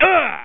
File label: SCREAM